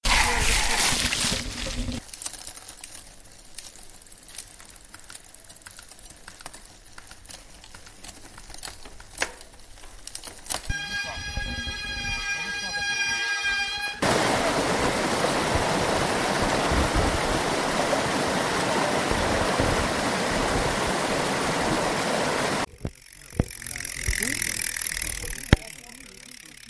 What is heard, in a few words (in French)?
Bruitages